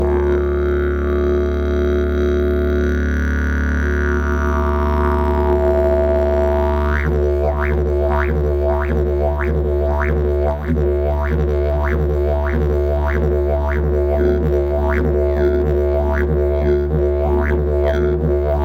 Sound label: didgeridoo, jilm; fesak